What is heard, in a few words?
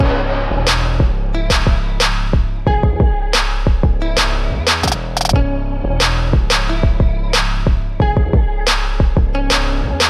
hiphop; rock sound